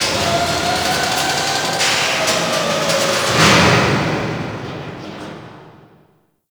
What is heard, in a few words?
Ocean Conveyor; Ambience; Warframe; Grineer Ocean